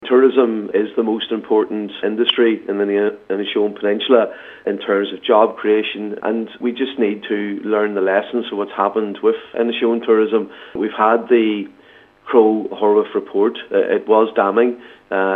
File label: pad